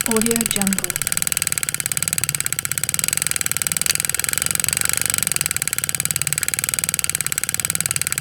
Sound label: synchronizer spin 35mm film rotating with break; چرخش فیلم 35 میلی متری; interface sounds